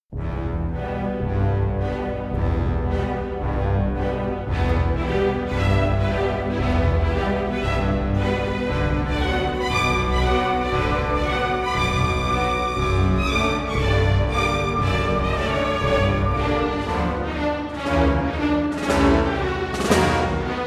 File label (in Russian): Музыка